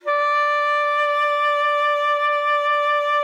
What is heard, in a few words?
D5, alto sax; Xsample VOL-2